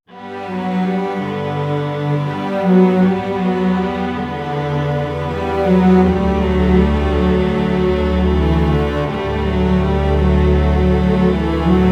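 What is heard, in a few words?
G major, Low Strings, 80; PowerFX; Downtown Orchestra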